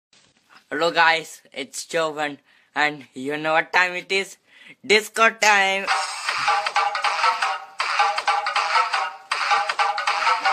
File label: disco time ringtone